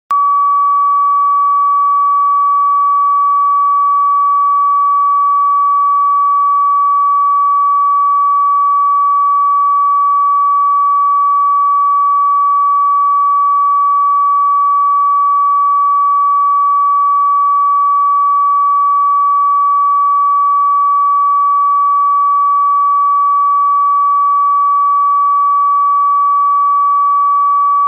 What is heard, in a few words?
TCXO 20MHz x22 440MHz